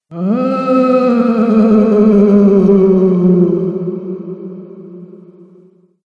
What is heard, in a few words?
Ghost Spooky Moan; Ghost Sound Effects; horror